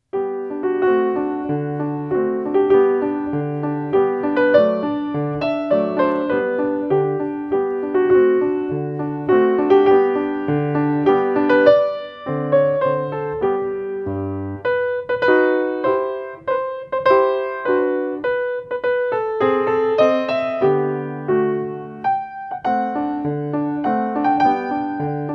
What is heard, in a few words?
Minuet Beethoven pianist